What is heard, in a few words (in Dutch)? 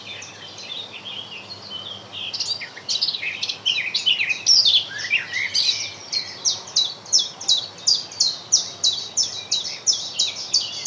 Bosgeluiden lichte vogels zonder vliegtuig